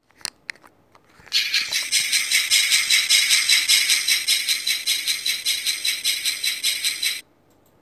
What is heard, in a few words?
Noisy Trains